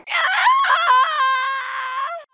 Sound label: fall scream; mk9 mileena